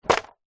wood hardboard panel thin set down flat concrete ground; foley; zapsplat